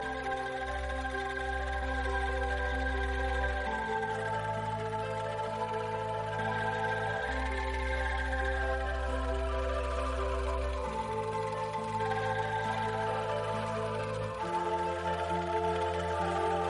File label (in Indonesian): diam nada dering